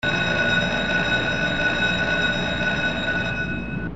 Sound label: Sound effect school bell